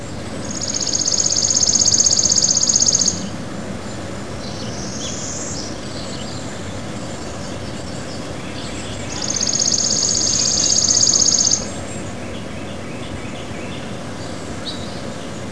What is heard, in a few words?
chipping; birds; usgs